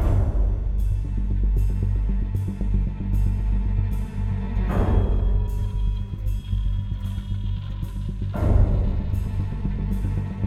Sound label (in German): Spannung Loop